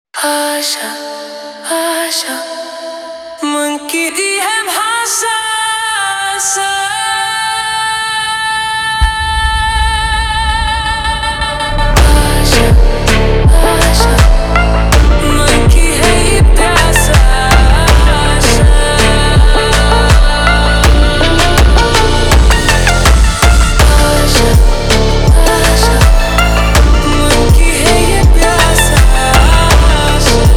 slowed

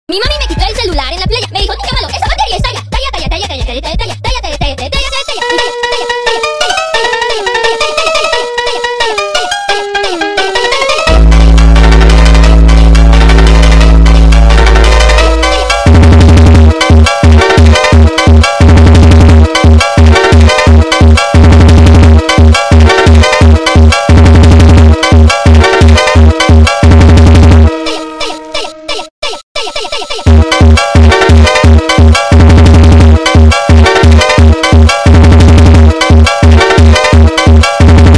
funk speedup

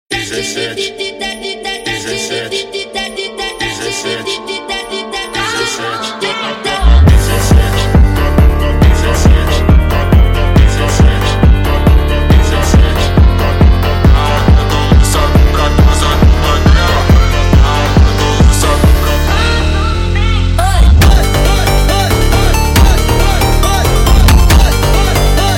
Funk sped Up

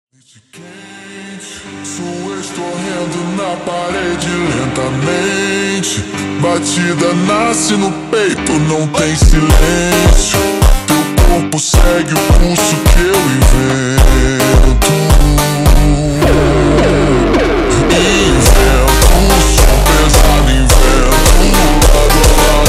Montagem super Slowed

